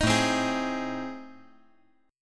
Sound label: A Minor Major 7th Flat 5th